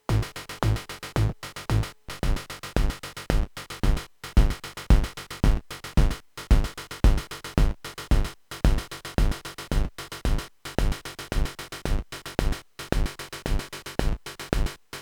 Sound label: Kick Metal PUMP; Twisted Electrons deton8